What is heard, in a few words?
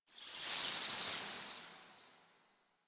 Sentient Lair Exterior Creature; Warframe; Ambience